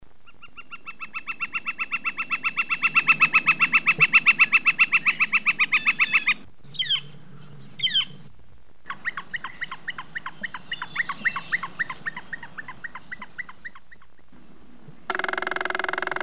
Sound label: flicker, bird sounds